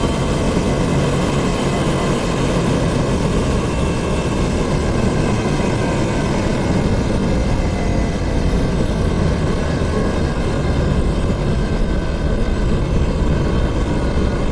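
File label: vehicle swoop decel; sswoop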